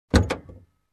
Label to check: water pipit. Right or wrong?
Wrong